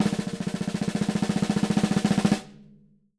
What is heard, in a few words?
SN, SD ROLLS, Living Drums; Sampleheads; Peter Erskine